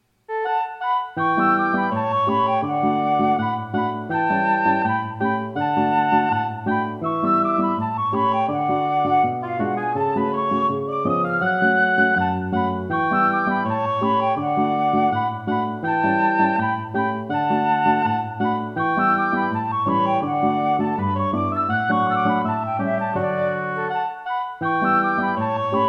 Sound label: trio